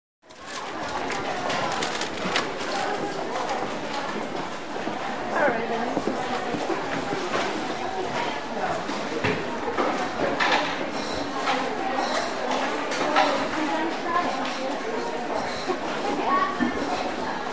Large Department Store Ambience, Very Busy. Mostly Medium To Distant Walla, Footsteps By Close, Medium Cash Register Printing And Phone Rings.